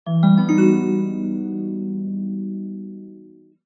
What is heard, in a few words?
cartoon mystery musical tone